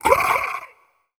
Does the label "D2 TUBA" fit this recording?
No